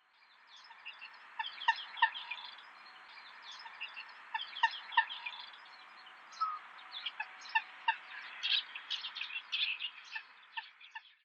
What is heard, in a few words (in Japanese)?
ban, himeji, koe